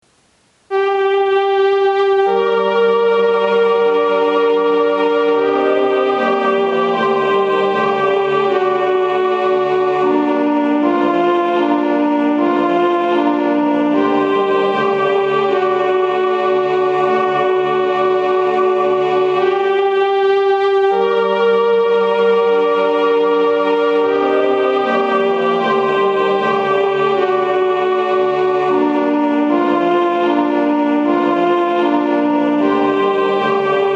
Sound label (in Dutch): Haec est clara dies - ALT, klankopname (digitaal); sintjanskoor